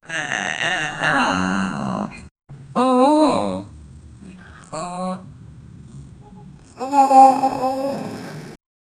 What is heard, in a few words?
epic